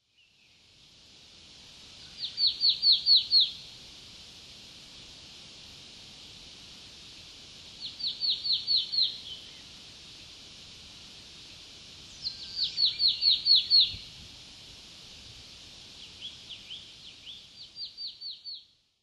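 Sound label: Marsh Tit; tomakomai; koe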